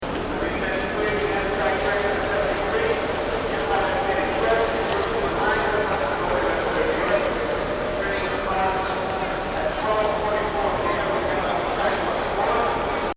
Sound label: Grand Central Station, Transportation; Backgrounds; Bobby Cole